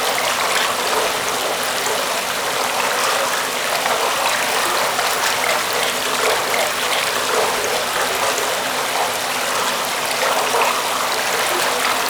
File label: Stream Loop